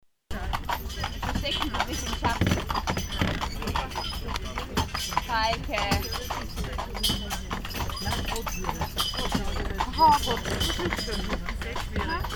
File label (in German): Kutschenfahrt Pferde; Kutschenfahrt in Eichenberg – Die Hufe von Gerd und Falke am Asphalt. Diese Gangart nennt man Schritt